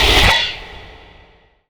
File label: spike trap; dota; conquest